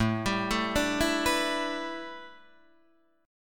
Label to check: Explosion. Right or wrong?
Wrong